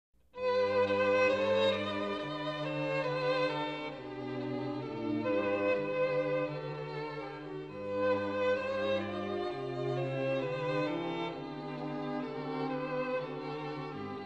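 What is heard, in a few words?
chamber music string quartet; ODE TO JOY; Classical Quartet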